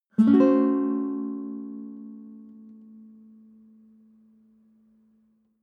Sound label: nylon guitar chord high diminished soft